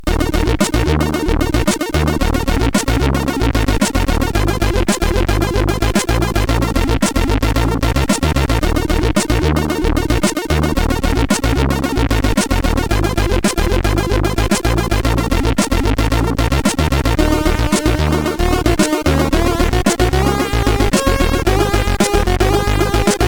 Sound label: Quick Intro, CSG8580R5